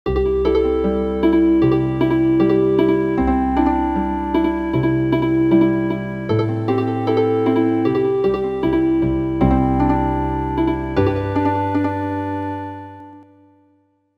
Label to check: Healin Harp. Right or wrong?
Right